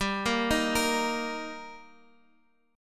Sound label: G Minor Sharp 5th G down Guitar Half Step Down